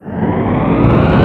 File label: cannon charge